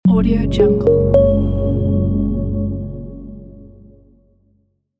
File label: marketing logo